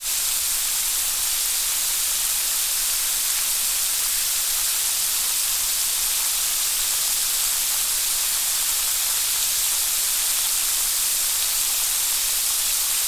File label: water leak spray from connection